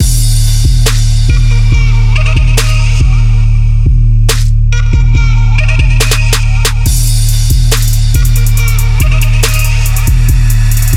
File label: 140 Dmin